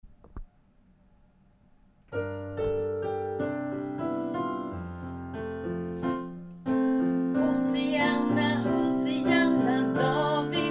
valkommen hit alt; adventskor

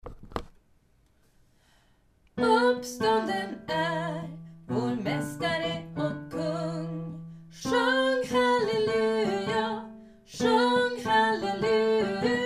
tenor; uppstanden

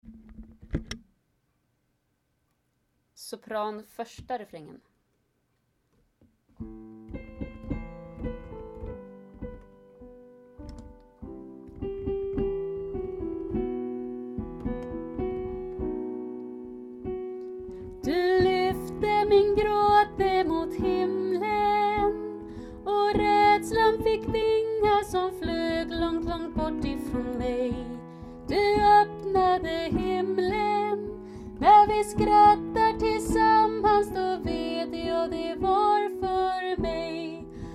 du lyfte min grat sopran

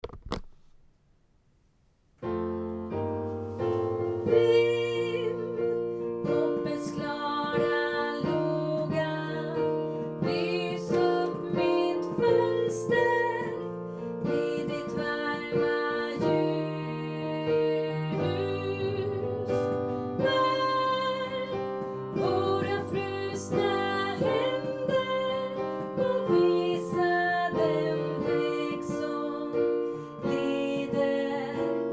hoppets klar laga refrang bas; adventskor

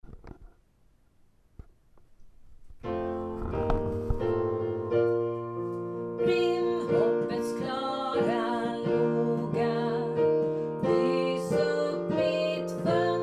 hoppets klara laga refrang tenor; adventskor

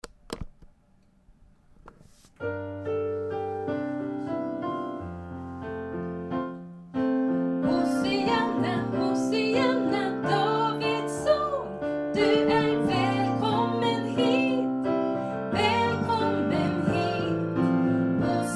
tenor; valkommen hit; adventskor

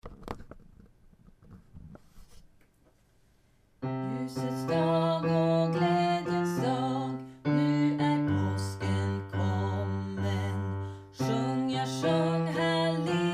gladjens dag bas

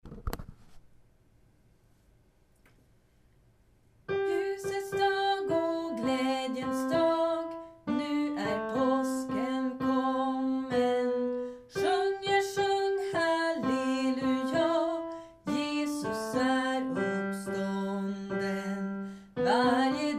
gladjens dag alt

